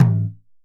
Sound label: JUNJUNBA HIT; Best Service; World Colours